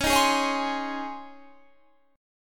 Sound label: C sharp Major 7th Suspended 2nd C sharp, Guitar Standard; strummed; 21,18,18,18,21,20; capo=2